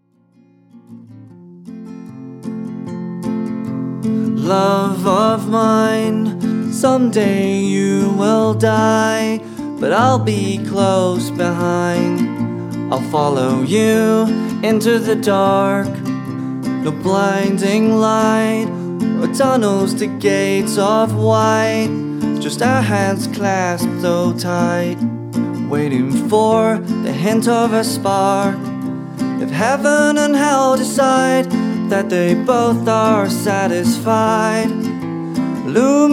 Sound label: Singing Sample